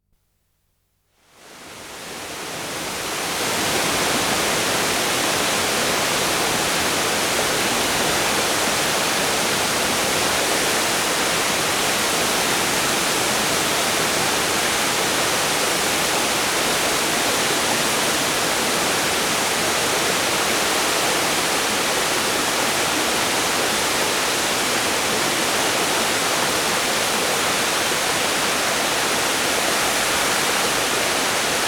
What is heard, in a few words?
Water Flowing Over Rocks In a Stream; Dr. Sound Effects; 210 Nature Sounds - 20 Hours of Relaxing Natural Ambiences for Meditation and Sleep